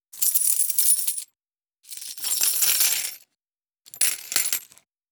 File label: Coins Pouch Leather Drop Into